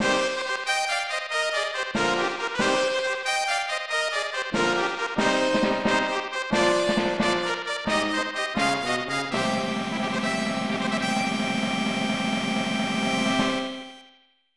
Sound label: Fanfare